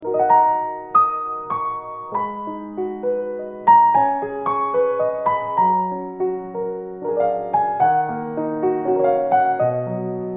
C. Bechstein L, piano

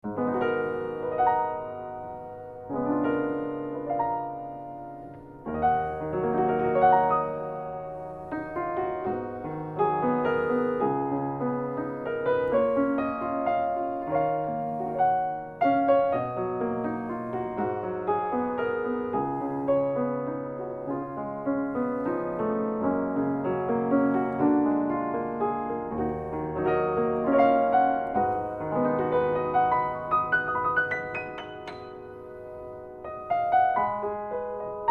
Yamaha UX3, piano

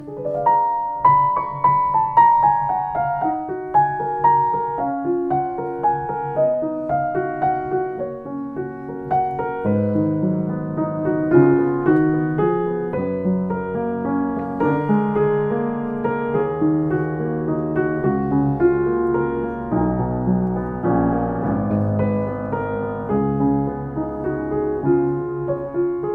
Forster M115, piano; August Forster

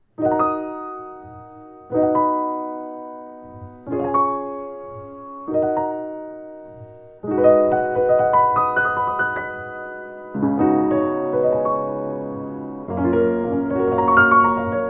Schlogl 126, piano

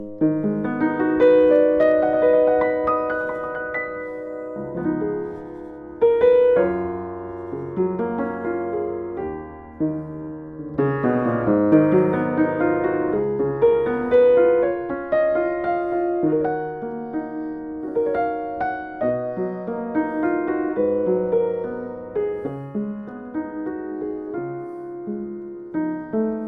Yamaha Fuji, piano

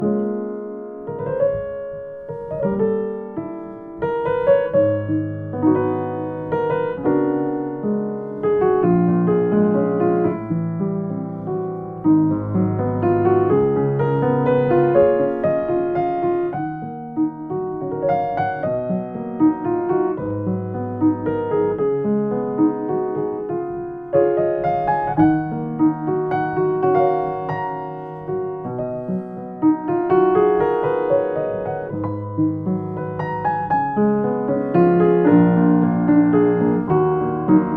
Yamaha U1H, piano

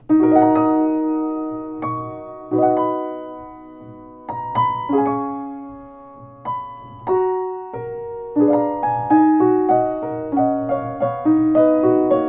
Fridolin Schimmel F121T, piano

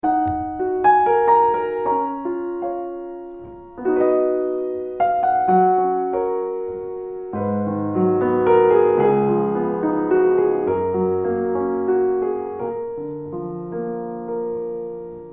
Zimmermann Z 125, piano

